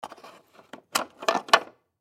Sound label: Foosball Table; Blastwave